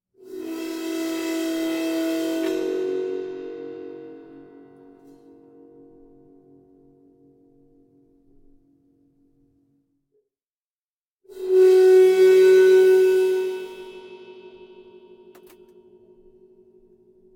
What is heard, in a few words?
Instrument Cymbal Bowing Low Pitch Ringing ShotgunMic; Guild Wars 2 (Original Foley Soundtrack)